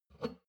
shot glass grab table; berufe; soundpool

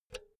power cord pull out quick soft; objekte; elektronik

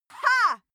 female cheer hah; schreie; menschen

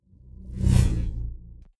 sci-fi vehicle drive by buzz fast; raumschiff

